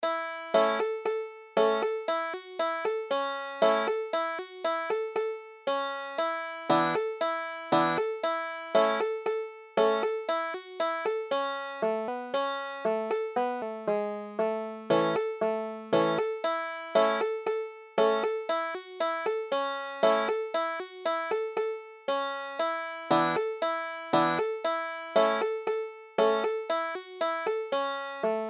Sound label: banjo; little dutch girl